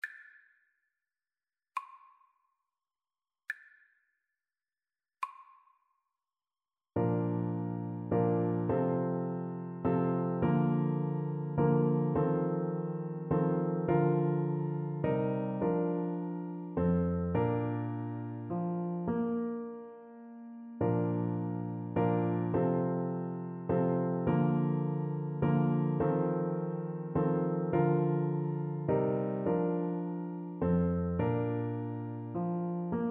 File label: Bassoon